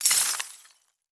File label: Crash Glass Crunching On Pavement; crashes; AdobeCC